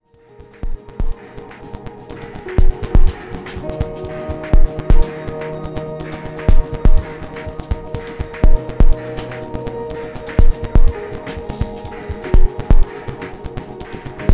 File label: house